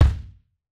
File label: Kicks; Touch Loops; CosmicFunk